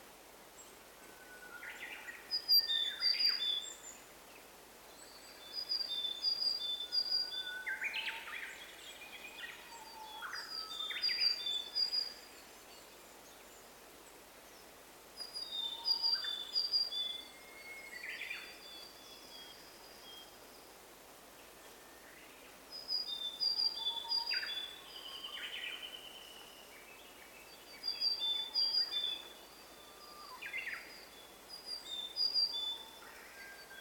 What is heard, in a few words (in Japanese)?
kogara, bird